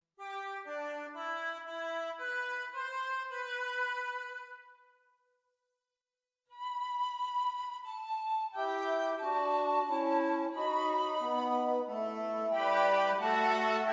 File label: Ave Maria for Chamber Orchestra